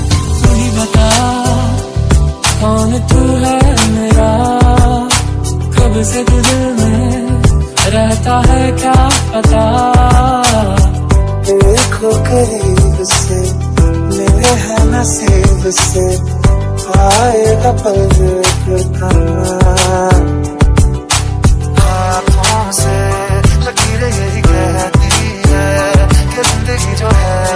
sad remix ringtone, bollywood ringtones

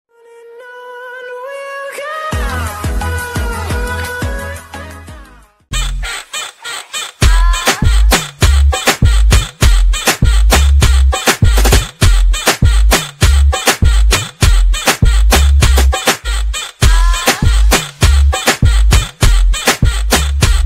romantic english music ringtone

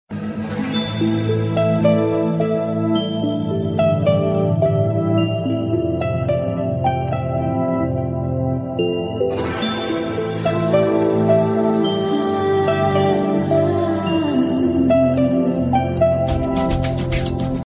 soft sms ringtone

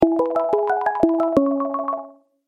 ringtone, nokia sms; phobos

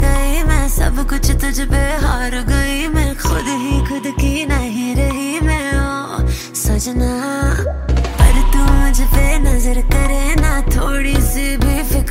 ringtone bollywood ringtones

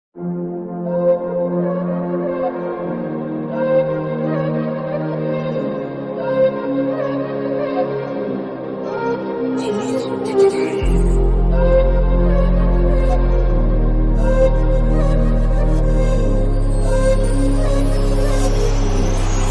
soothing music english tone

